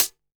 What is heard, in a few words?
smooth hi hat sound c key; Duration 0:01; Samplerate 44100 Hz; hat samples